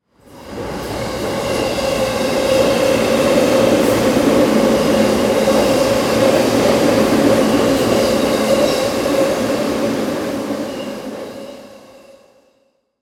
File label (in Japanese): densya